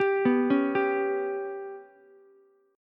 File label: G Major Flat 5th Ukulele, chord; GMb5 arpeggiated; JGuitar